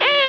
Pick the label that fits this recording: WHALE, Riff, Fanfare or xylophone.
WHALE